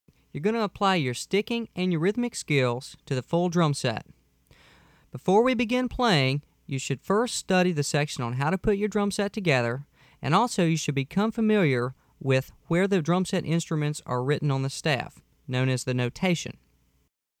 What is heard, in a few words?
Playing The Drumset